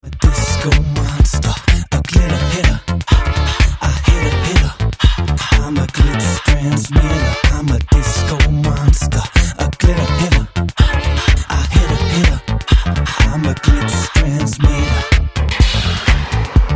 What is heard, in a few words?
Disco